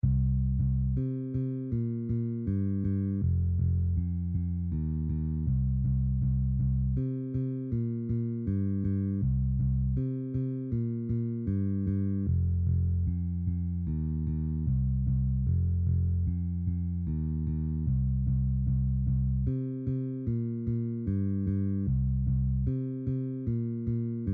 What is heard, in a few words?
Octave Bass Line in C, bass; Beginning Bass